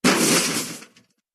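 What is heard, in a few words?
car crashes into wall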